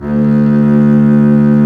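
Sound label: STRINGS, Cb Bowed f; Roland L-CD702; Cb2 f vb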